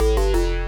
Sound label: Synth Prelude; Rhythm Doctor